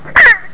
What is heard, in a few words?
squeek; JURASSIC